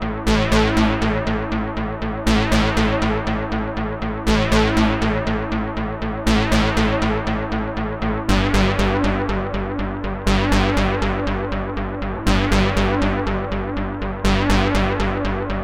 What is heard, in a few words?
Synth, EDM; Sonic Foundry (Sony Creative Software); Afterhours EDM; Retro Active